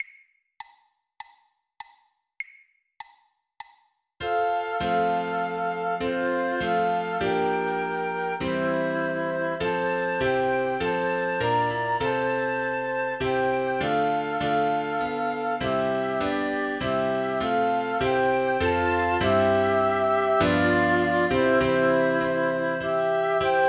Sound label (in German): Flöte Klavier; O Come All Ye Faithful; 100bpm, 2x; weihnachten